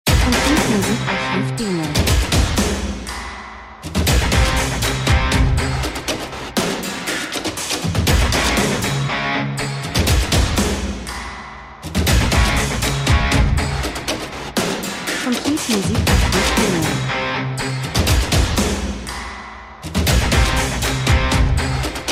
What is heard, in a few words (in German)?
drums, rhythmus; Hart voran dunkel cool Metal Sport Schweiß Boxen Industrie, Film, TV Schlagzeug Gitarre Bass